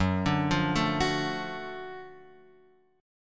F sharp Minor Major 7th Sharp 5th F sharp down Guitar Open F; arpeggiated